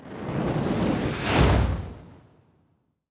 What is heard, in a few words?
Fire Spell, Magic Sounds; Fantasy Sounds Bundle